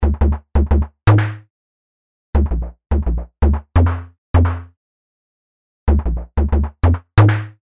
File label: snare; sidekick